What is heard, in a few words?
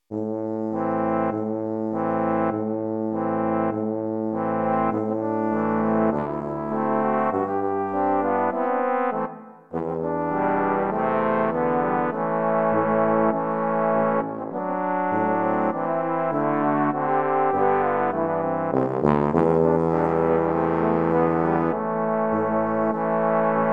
Romanian Folk Dance